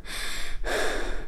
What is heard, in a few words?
female, Realistic Fatigue Voice; oblivion